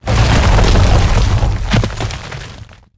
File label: Rock Throw Grab; Evolve; Goliath